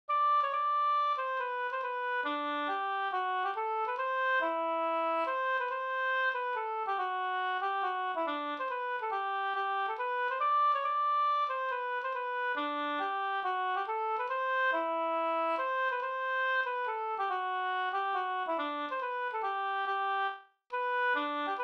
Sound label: mazurka; Krocklesas mazurka Vg